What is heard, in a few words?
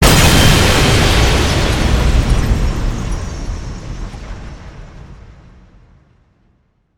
powerful bomb explosion with fragments and glass; zvuki bombyi